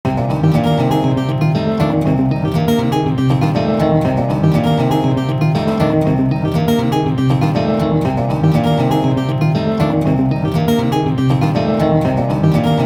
Desert Brawl, For a cowboy brawl or any desert level, you can feel the dusty wasteland in the music; another piece that loops and is from Garageband; Liberated Pixel Cup